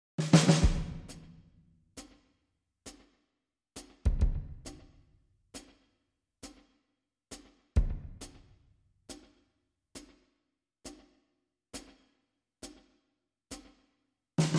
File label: Drums Clean